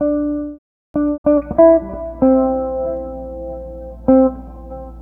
Jazz Guitar; Sonic Foundry (Sony Creative Software); Stylus Pressure Urban Grooves on Digital Wax

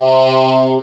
"oh", Talk Box synth

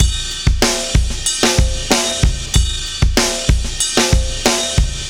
Beat Loops, 094; Zero-G - Monster Beats

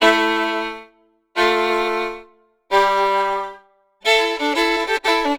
Celtic Violin; Sonic Foundry (Sony Creative Software); Continental Drift Aftershocks

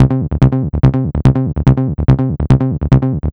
Bass; Sonic Foundry (Sony Creative Software); Machine Language

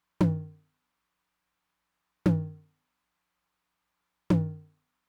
mid tom with, Roland TR-909; Roland TR-909 Ableton Project

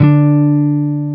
Jazz Guitar

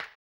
HAMBONE, Vocal Blues Soul

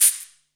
shaker; Zero-G; Total Drum Bass; Drums Percussion

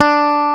BASS D-3; WORS STATION; ROCK

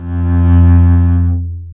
STR, Cb Bowed; Roland L-CD702

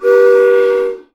TRAIN W, WHISTLE; Voice Crystal - Steve Reid Definitive Percussion; ASRT WHISTLE

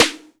Snare, Alesis DM5

